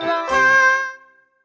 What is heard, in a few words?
Beats Pickup; Sparklite